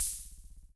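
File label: Kay cymbal, Kay DRM1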